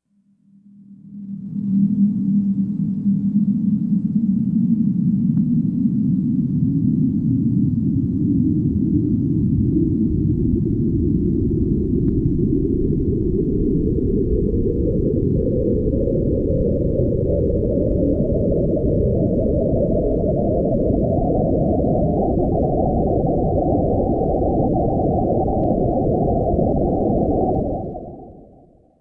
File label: Granular